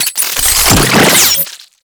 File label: Ink Rifle Reload, Weapons; Warframe; Tenno